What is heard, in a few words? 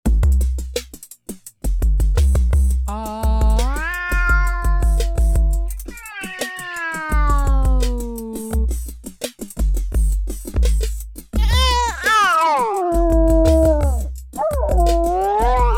morphs